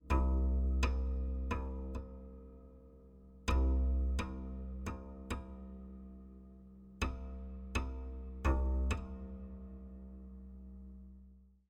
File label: Zither Bass Buzzing